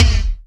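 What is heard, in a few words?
R8 BD, R8 bass drum; E-Lab; X Static Goldmine 1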